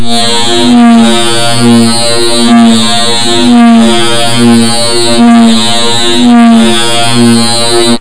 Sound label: Speedway; L'Effet Pommier - Volume 04